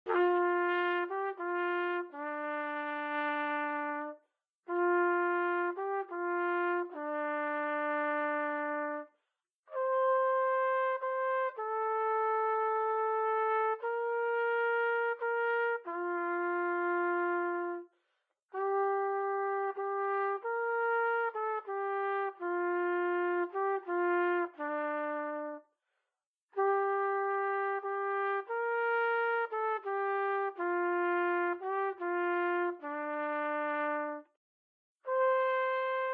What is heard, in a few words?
Trumpet; Zomboid; Lifestyle